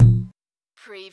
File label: CARTOON BONK